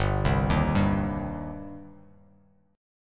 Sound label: G Suspended 4th Sharp 5th G down Bass Standard; arpeggiated